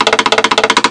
Stick; Tidledlenok

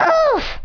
Ooff; Danish

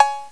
Analog Cowbell; Drums; GMPlay; XG

